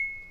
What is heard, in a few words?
crystal chime; everness